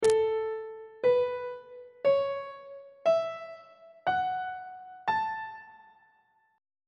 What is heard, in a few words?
a major pentatonic scale on treble clef